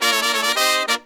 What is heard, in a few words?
Pop & Funk Brass